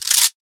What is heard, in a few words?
sniper rifle ready; PUBG